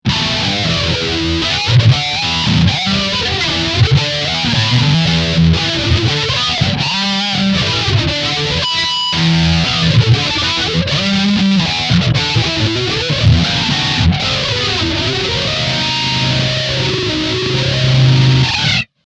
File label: Flange Heavy